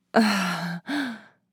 Sexy moaning woman female

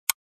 Plastic flashlight switching on

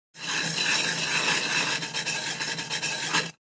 Wire brush on metal scrubbing pan kitchen

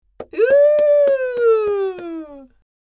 Old lady applause and cheers

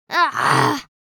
Young girl grunts

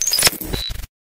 Sparkly futuristic glitch

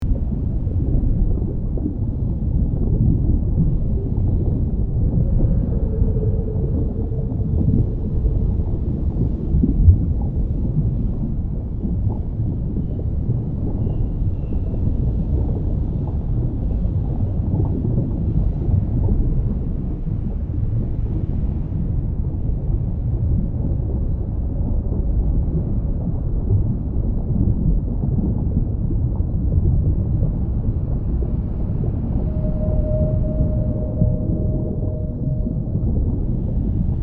Volcanic cave ambience underground; nature

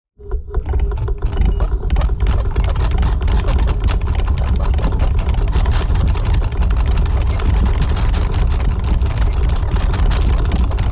Rotating vintage mechanism sequence; steampunk; gfxsounds

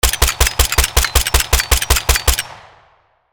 Heavy machine gun automatic fire short; guns

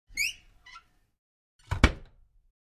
Metal home door opening and closing

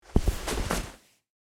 Trousers rustling clothes putting on; clothing

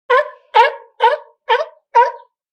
Sea lion barking; fish and aquatic; gfxsounds

